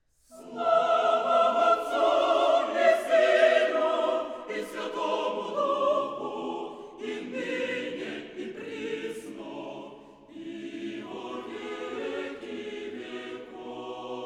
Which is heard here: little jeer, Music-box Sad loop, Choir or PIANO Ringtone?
Choir